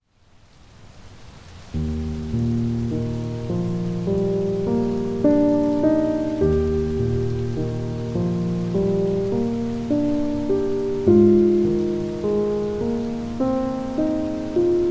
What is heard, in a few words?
Music for Prayer (With Rain)